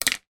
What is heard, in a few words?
toothbrush place down; household